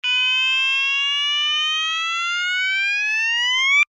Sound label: alarm tone, sounder alarm tone; sounder beacon